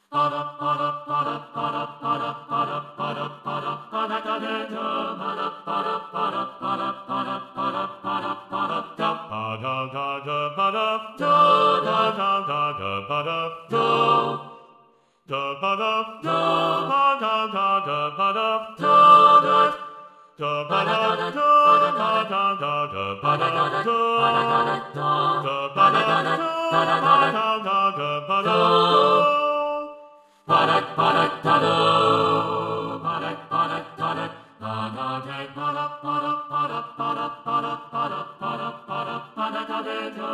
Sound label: Funk warm up